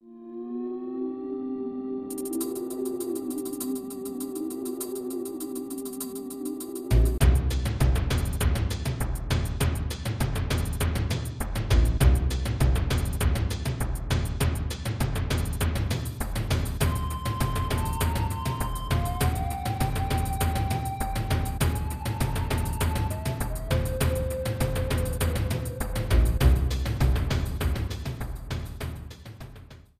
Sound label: Cliffs theme; Rockface Rumble